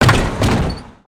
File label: CAR SUSPENSION; FBI CAR; vehicles; aronwk collection; ndaudio